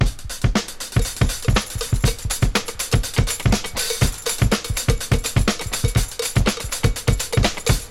121 bpm hq drum loop e key, kick tuned to the E note